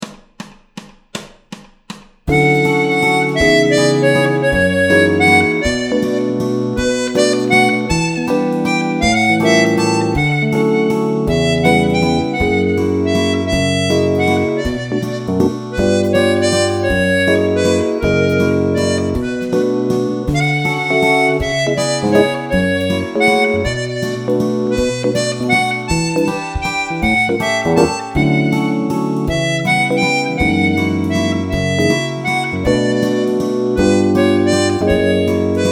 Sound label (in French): sans basse